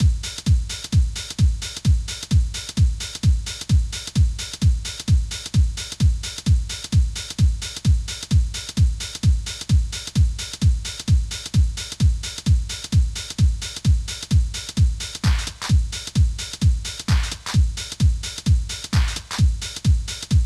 nightbird, soft jazzfunk; Digital Symphony Collection

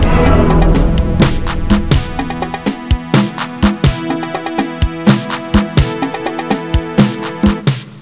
Bonus Drum Beat; Zone Warrior

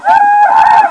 bump

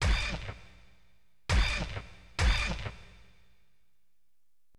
STAB; LiveAct Pool 1

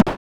hammer; Mistergenerator

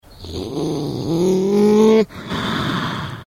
beaver; Nestle Animal Planet Land

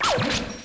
Text Laser; Let's Discover Michigan!

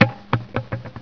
TIRE; mscart

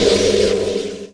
Nav Gate Energy Close; Earth and Beyond; EA Games

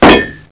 metal hi

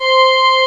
Church Organ (C5); X-Wing; X-Wing Patches

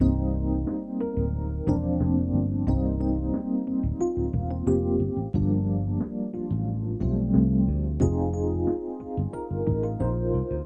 Bckgrnd

